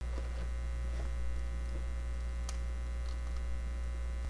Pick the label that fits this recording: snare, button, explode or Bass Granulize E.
explode